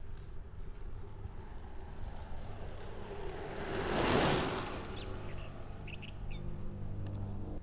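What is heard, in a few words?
Mountain Bike By Fast, bicycles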